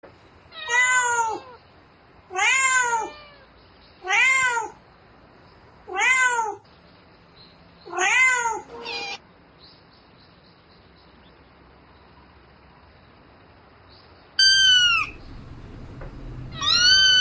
Tieng Meo keu goi ban

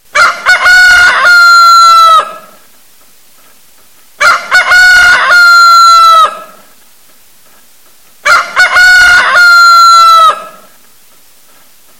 Tieng Ga gay

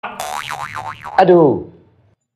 tin nhan doc

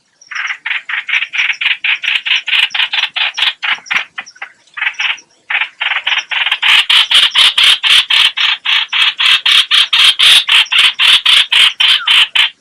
Tieng chim Hoanh Hoach keu moi

